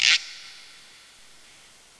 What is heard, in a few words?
Zip